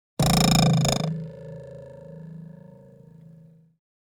sword twang; fights bodyfalls; Asian Show Sound Effects

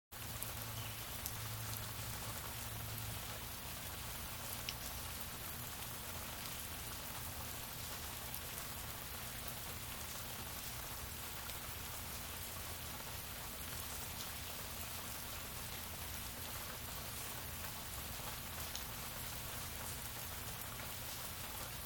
rain

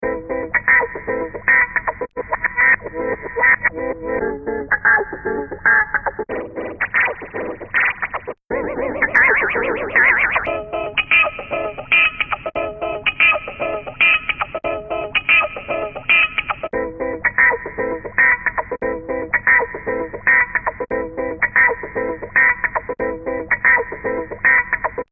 gee-tar

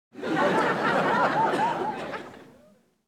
large crowd laugh; Asian Show Sound Effects

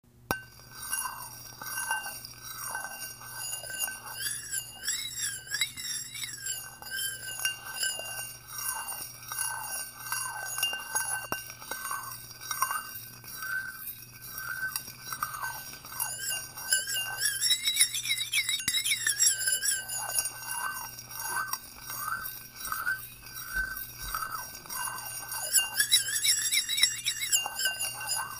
bowl

